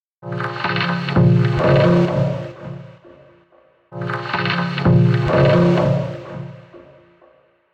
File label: Dusty Dub